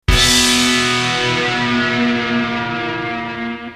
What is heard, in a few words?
finish - lose; aspen lake